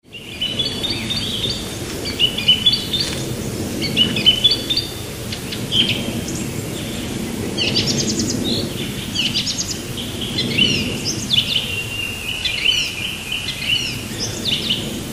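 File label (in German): Vogelstimme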